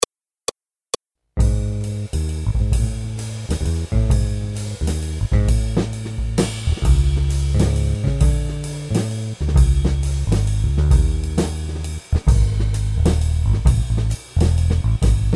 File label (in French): blues waltz Accompagnement seul; Jazz